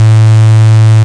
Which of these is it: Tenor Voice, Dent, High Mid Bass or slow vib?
High Mid Bass